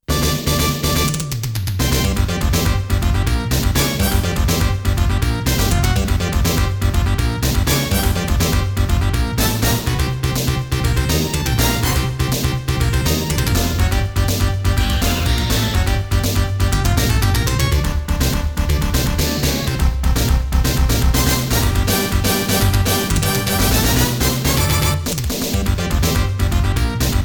Blues; Konami Game Music Collection Volume 2

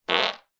Fart; Talking Ginger; Android iOS

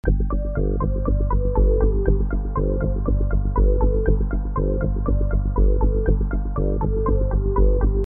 Gameloop; Rig a Bridge; Tom & Jerry

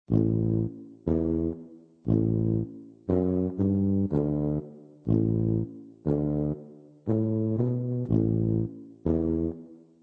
Tuba; Club Penguin Online Unofficial Soundtrack

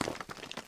Rock Move

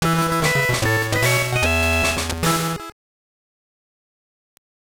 Jingle; Power Pro GB; SGB

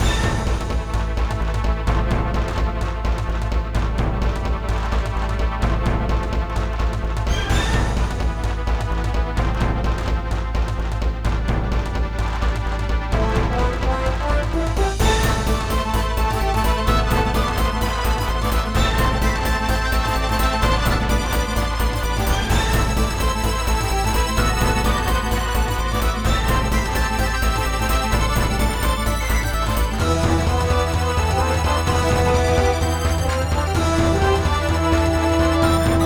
Calm; StreetPass Mii Plaza; Ultimate Angler; 3DS; Landing a Legend

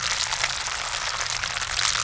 Flesh Loop

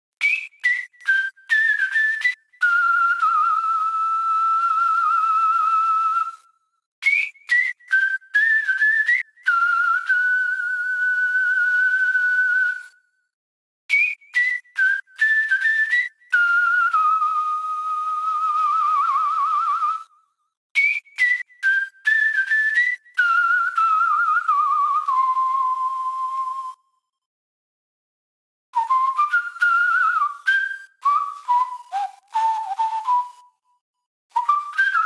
WHISTLE; snatcher

